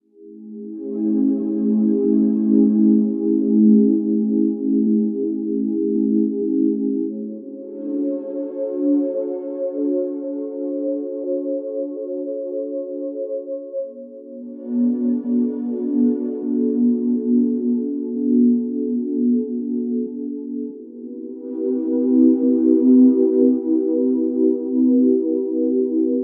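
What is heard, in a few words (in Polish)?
magiczna muzyka, 432 hz, magiczną muzyką instrumentaln; magic colour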